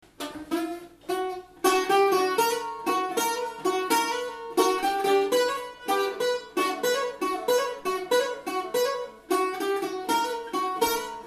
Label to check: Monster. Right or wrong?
Wrong